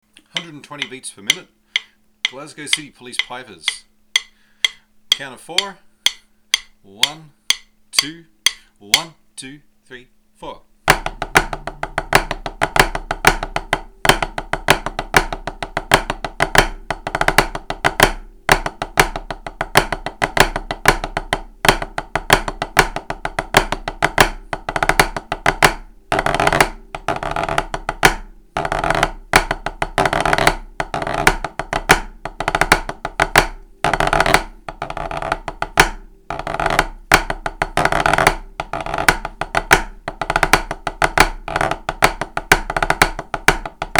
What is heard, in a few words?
Pipers Jig standard